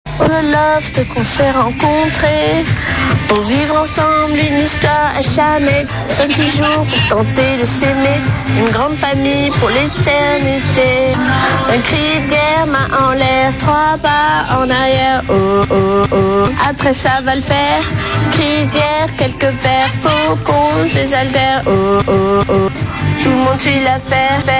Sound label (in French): chanson